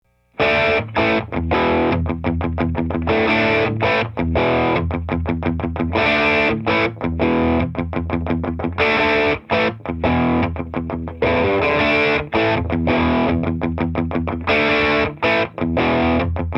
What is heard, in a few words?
dm drive soyuz LP